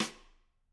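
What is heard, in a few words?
Snare Stick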